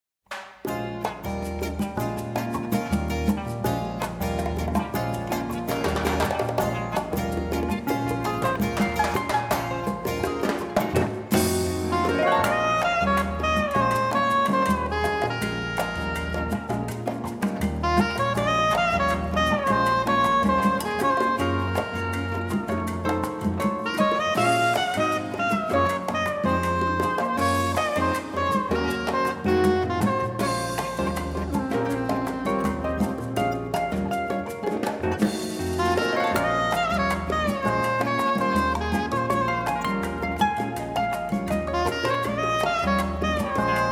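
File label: salsa, Jazz Harp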